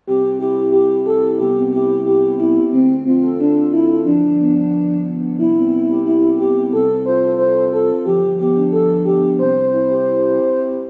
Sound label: hachioji melody; 1.9コーラスです; 横浜線 橋本・中山・東神奈川方面 ■相模線 橋本・海老名・茅ヶ崎方面